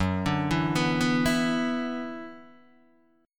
F sharp 7th Suspended 4th Sharp 5th, down, Guitar, Open D